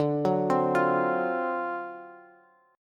D 7th Sharp 9th, Bass; arpeggiated; JGuitar

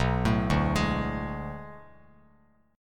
B Minor 13th B down Bass Standard; Bm13 arpeggiated; JGuitar

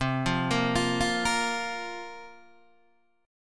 C 7th Suspended 4th, Guitar, DADGAD

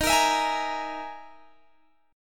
E Major 7th Flat 5th D sharp

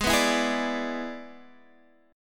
E 7th Ab, guitar

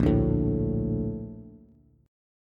Bb Major 7th, Bb, down, Bass

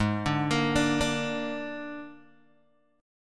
Ab Suspended 2nd Flat 5th, Guitar, Open D; 6,5,8,8,5,x; down

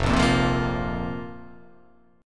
F Suspended 4th F

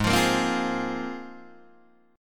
Ab 6th Add 9th Ab, Guitar, Open D

